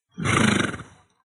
minotaure contre

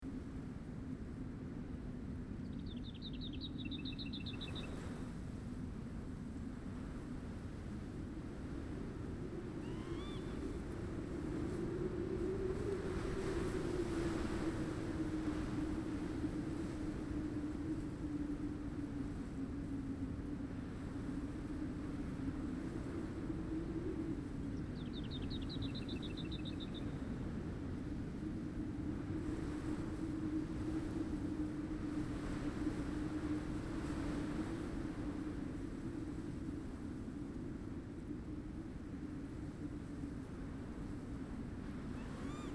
hiver, ambiance

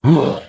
touche, monstres; deadmaze

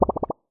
bulle

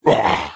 attaque, monstres; deadmaze

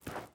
pas; deadmaze; marche

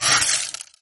piege glace

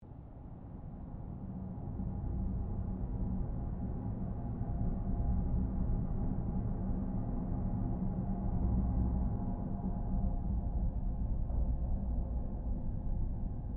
grotte, ambiance

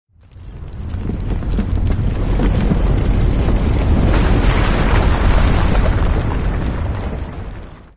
tremblement

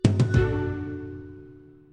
gain niveau; deadmaze

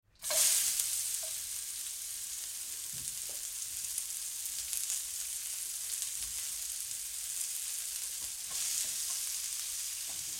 cuisine; deadmaze

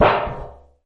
boule acier

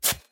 tranchant combo; deadmaze

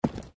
cheval

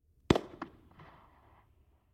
fusée; deadmaze

